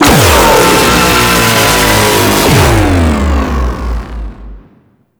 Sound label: Weapon Fire; Warframe; Teralyst; Bigalyst Abilities